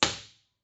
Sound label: IR